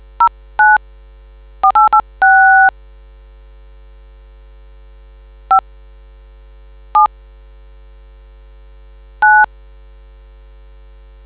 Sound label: dtmf